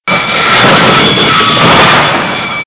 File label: Lift Brake Squeal; Playable Tutorial Level